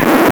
needle

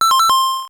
Ice Hockey pause

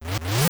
jump; Karate Champ

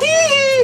TEEHEE

